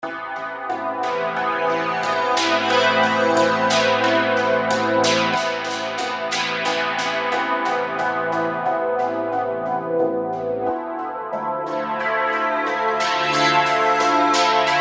Abbey Chord; V-Synth

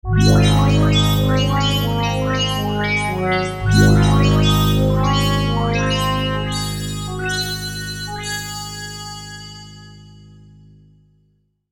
Zap Zing, V-Synth

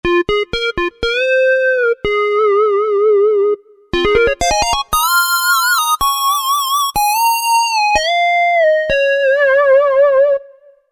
Juno Square, V-Synth

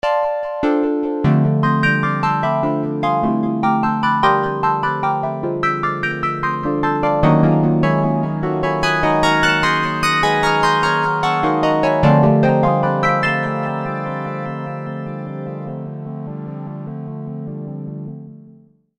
m pentatonic; V-Synth

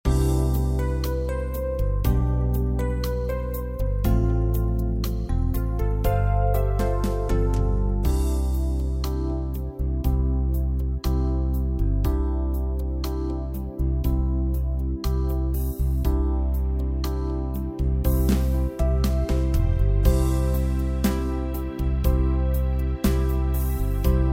FRIENDBT Cmaj; gw-7; Pops

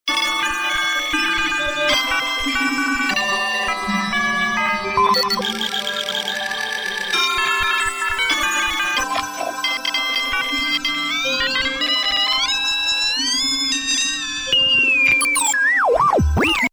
Clock Work; V-Synth

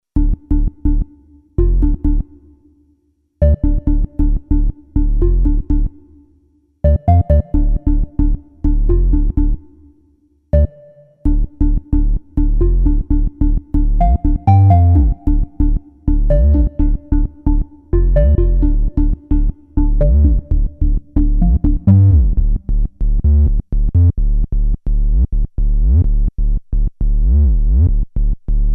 Club Bass, BASS; sh-201

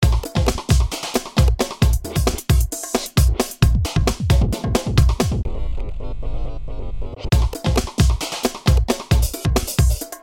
Rhythm Combo, V-Synth

